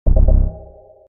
chime

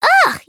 "ugh"; kotoko

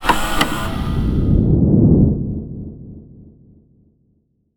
tape play

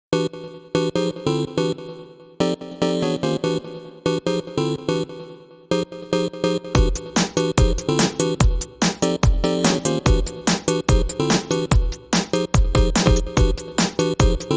technovision

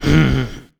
grunt

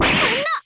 damage; bb mai natsume

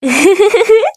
laugh

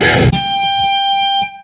guitar riff

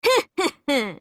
huhuhu; fgo; nero

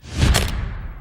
briefcase; birdman

